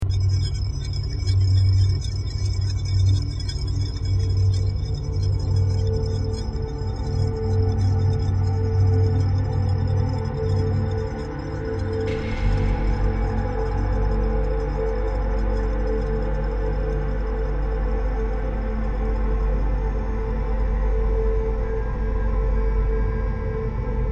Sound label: Halo Ambience; Bungie-Inspired Music